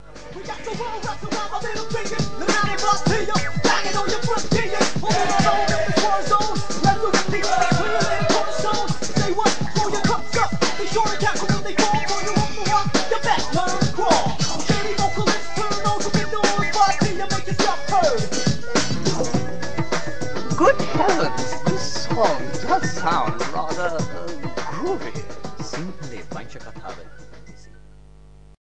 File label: santur